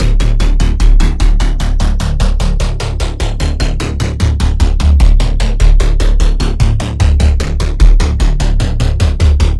spectral cinematic, bass; bitwig

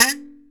Kalimba D3; Planet Earth; Digital Sound Factory Planet Earth

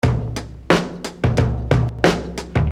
Beat, Hip-Hop Street Beats

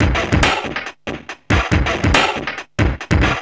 Beat, Industrial

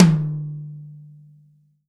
TOM, Real Drum Kit

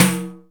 percussion, Ethnic Perc

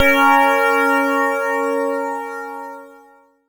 C#, BELL GONG, Synclav FM; Big Fish Audio

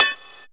Gated Kit, Percussion

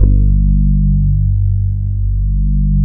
POP, Bass Analog & Synth; USB Soundscan vol.12; AKAI; GLAMOUR

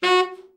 TENOR SN, Sax; Giga Samples Collection

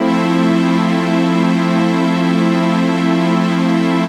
Glitchy Lushness, Strings & Pads; Zero-G Sound Sense Grime Scene; 90 s Sample CDs

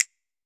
F. SNAP, finger pops

